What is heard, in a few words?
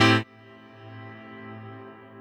CHORDS; EastWest; Dance Mega Hip Hop Electro